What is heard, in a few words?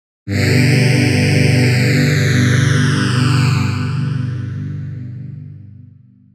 JP Phaser Pad; jup8